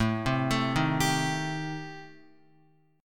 A-Minor 7th Flat 5th, Guitar, chord

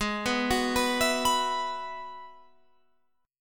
Ab Minor 7th Sharp 5th, Guitar, Open F; arpeggiated; 20,18,18,18,19,18

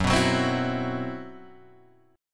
C Major 7th Flat 5th F sharp

